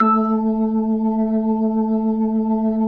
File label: B-3 Jazz; Keyboards of The 60's and 70's